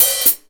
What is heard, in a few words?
OHH, REAL DRUMS, SWING KIT; Best Service ProSamples vol.43; Real Drum Kits